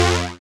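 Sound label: synth flange, dance synth; Sound & Vision - Gigapack I CD 2 (Roland)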